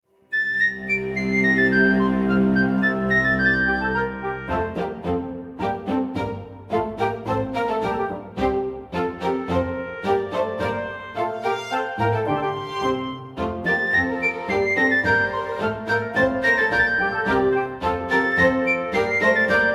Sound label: Samsung ringtone